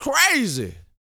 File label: VOCAL F; ILIO